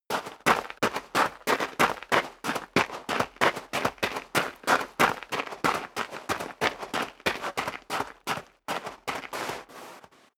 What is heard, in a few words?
step sneaker gravel light run sequence; schritte